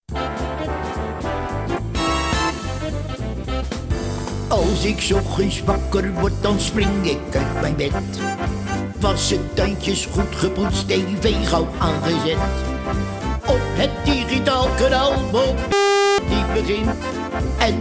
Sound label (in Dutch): tune piepje; Bassie; hallo hier bassie